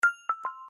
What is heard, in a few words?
notification alert; windows phone